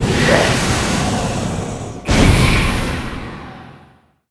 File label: mummy blader dead, monster